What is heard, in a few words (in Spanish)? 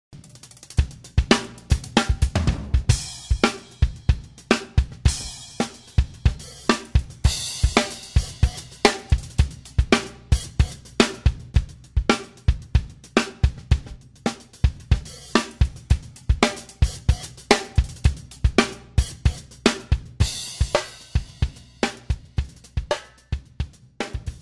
bateria